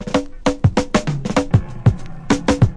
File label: filt snappy roll, DRUM LOOPS and BREAKS, FILLS and ROLLS